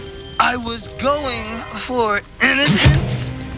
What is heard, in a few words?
pain